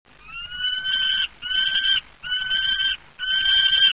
faucon

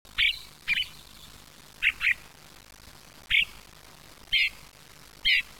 merle, sons

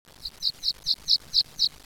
spioncel, sons

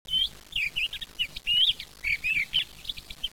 grive

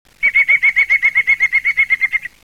pic vert, sons

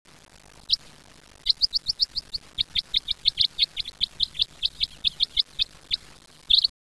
bec crois

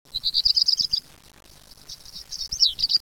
rouge gorge

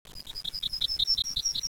bruant

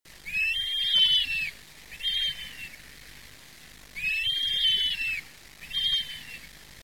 gypaete

